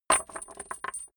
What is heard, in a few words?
small metal drop on wood